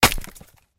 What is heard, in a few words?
Axe; wild animal racing